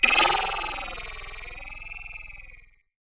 Ring Mod, Cymbal, contact mic; Murf & Ring Mod

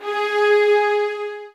G, soft violins; Q Up Arts; Denny Jaeger Master Violin Library

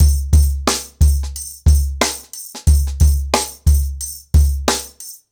Nuclear 90BPM, ACOUSTIC DRUMS

